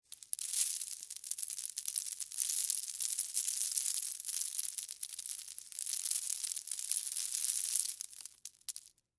Debris Cereal, Texture, Debris, Long, Cereal, Corn, Flakes